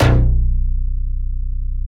Bass Shot B

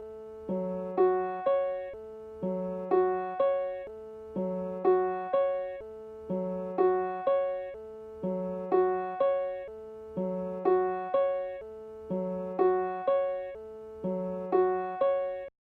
124 BPM F# Min Piano Dry; Melody; Loop Stems; Cymatics; 2022 Melody Collection Platinum Expansion; Skeletons

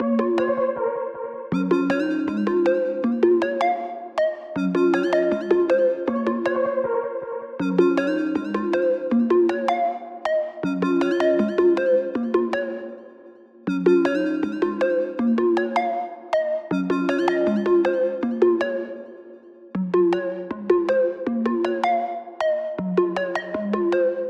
158 BPM A# Min, Hip Hop Melodies Virtual; Waveracer

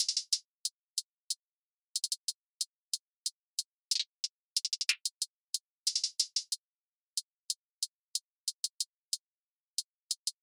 Drum Loop, 92 BPM Hihat; Loop Stems; Livid Drum Loop; Cymatics; Vibes II Drum Loop Collection

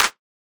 Clap; Humble Infatuation Clap; Cymatics; Humble Hip Hop Sample Pack

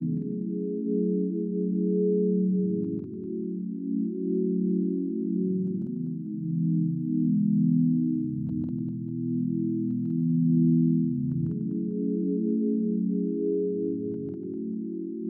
85 BPM C# Min Pad; Melody; RnB; Loop Stems; Cymatics; 2022 Melody Collection - RnB Pt 1; Distance

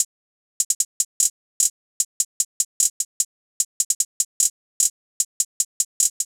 Drum Loop 150 BPM Hihat, Trap; Alterations; Cymatics; ANALOG EVOLUTION Beta

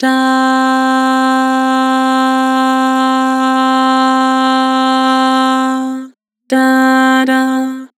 "Dah", Vocals; Harmor

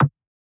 Vintage Percussion; Xi; Cymatics; Mystery Pack Vol 4

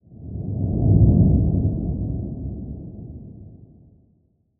Windy Whoosh; Whooshes

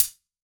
Hihat, Closed; House; Drum One Shots; Hihats - Closed

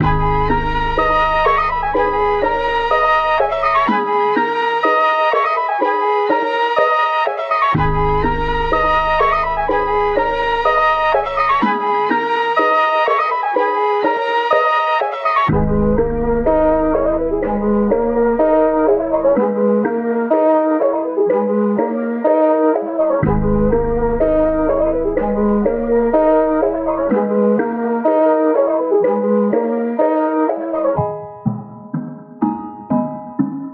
124 BPM G# Min; Cymatics; 2022 Melody USB Expansion; Vandal

